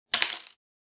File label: Dart falling to floor surface impact; Darts